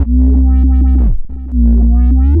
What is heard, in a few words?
Roland B Line, Roland S100; musicradar-synth-samples